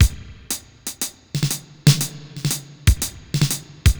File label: Spring Verb Compressed TR 505 120BPM; musicradar; cyborg beats